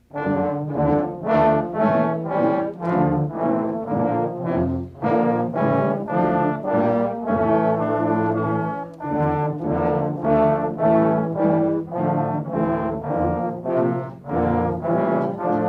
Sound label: brass arrangement of God Rest Ye Merry